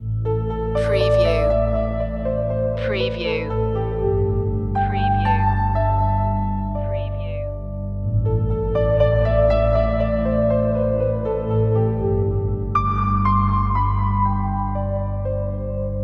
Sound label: open space loop